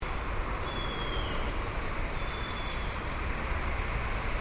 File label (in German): habicht